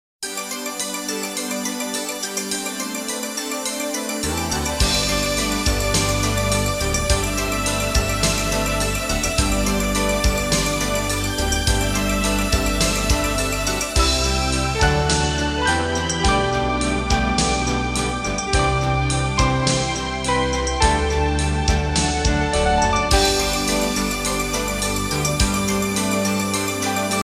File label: Christmas Ballad